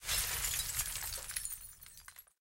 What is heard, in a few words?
explosive glass layer